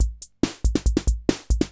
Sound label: 140 POPS